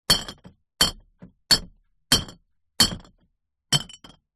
impact glass bottle in recycle bin hit others no break multiple times